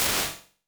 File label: Gated Chamber; Medium Spaces; Apple